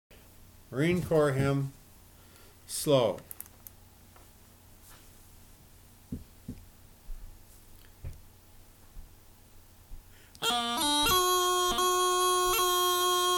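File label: Marine Corps Hymn slow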